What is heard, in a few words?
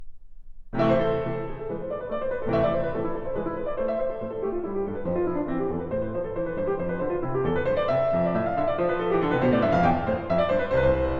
Partita No. 3 in A Minor, Scherzo; BWV 827; Bach; Partitas, BWV 825-830; Igor Levit